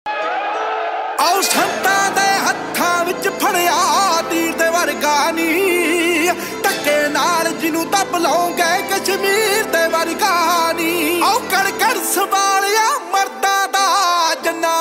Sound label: DJ Punjabi Ringtone